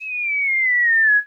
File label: Cartoon Bomb Fall Whistle; SFX Kit; Foley - Misc